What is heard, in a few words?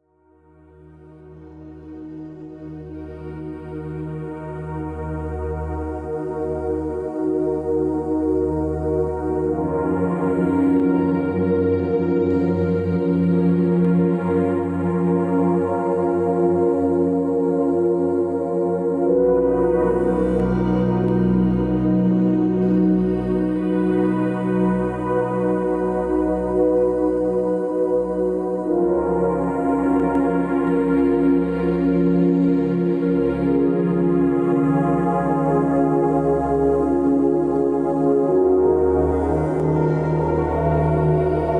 Lucid Dreaming Frequency Booster Audios; Master Control; AstralHQ